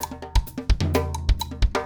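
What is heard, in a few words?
Latin Jazz Drums Toms, 128 bpm; Pro Tools Essential Loops; Digidesign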